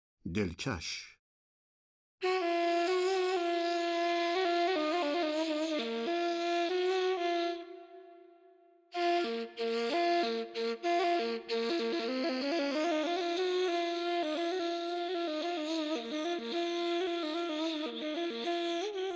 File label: Delkash Mahur Radif-e Ney; Dastgah Mahur